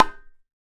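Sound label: KHOL HEAD, DRUMS OF IND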